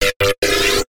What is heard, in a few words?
sound design glitch or short circuit